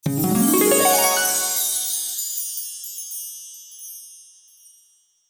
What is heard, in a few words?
Fairy godmother arrival